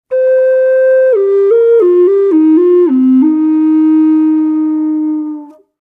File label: c minor concert, flutes; hi vol